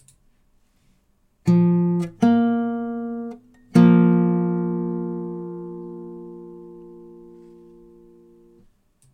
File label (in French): quarte, guitare